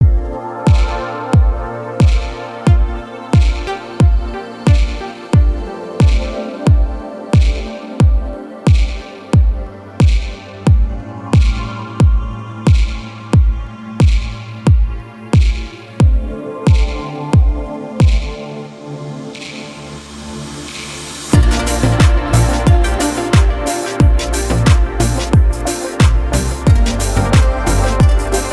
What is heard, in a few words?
Deep House Music